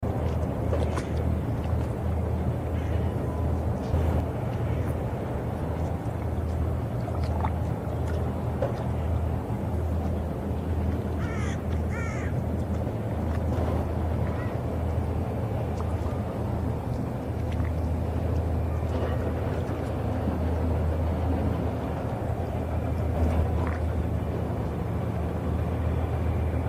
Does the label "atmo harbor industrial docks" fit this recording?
Yes